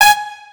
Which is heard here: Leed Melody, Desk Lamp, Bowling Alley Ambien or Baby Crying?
Leed Melody